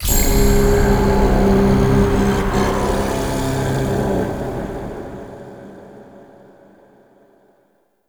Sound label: Tablet Correct; Warframe; Duviri; Easter Eggs